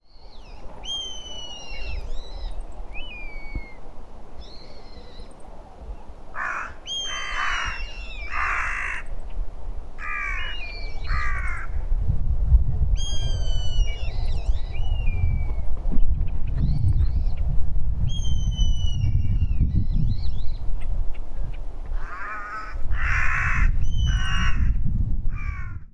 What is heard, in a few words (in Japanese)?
nosuri mobbing